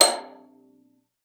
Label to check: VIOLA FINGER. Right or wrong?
Right